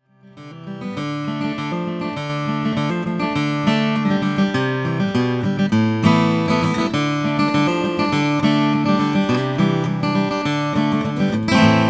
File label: flammang el45 sunburst